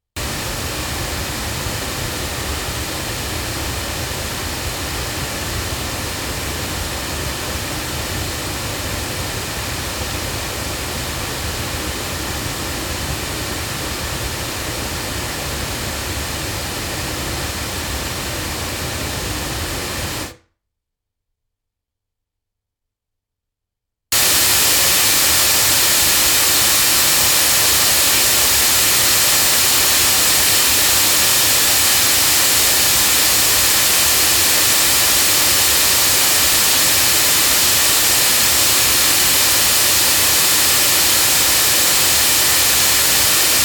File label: Sontronics Orpheus 8 loudspeakers, microphones recordings